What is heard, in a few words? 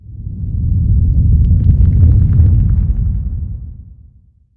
mine falldown; ambient